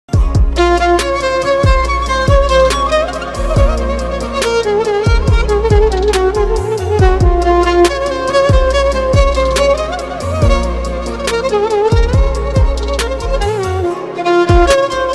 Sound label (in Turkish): keman zil sesi